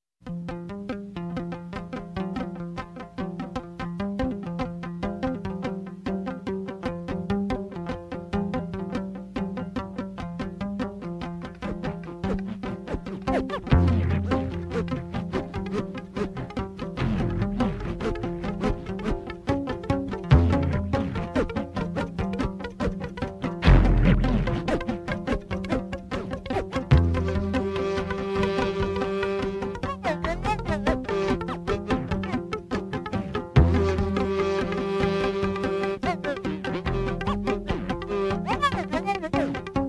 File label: Stylophone Study